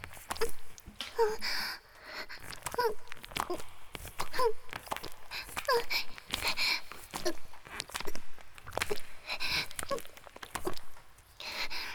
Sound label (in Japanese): (雨降りバージョン) (薪爆ぜ音無しバージョン) ギシアンループ; 田舎の別の無感情少女と自己犠牲愛2